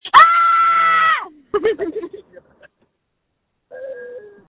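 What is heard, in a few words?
Scream, phone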